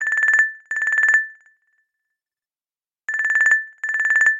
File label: Android Ring